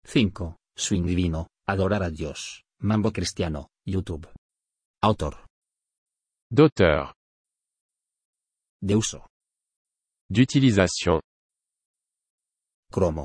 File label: swing, mambo cristiano